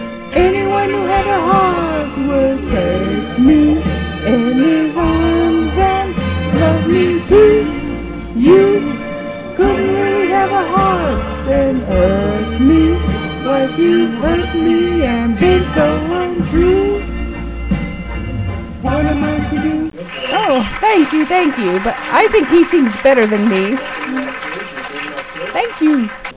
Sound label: singing